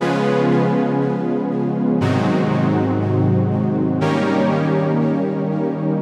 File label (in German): H-Dur Nebenakkorde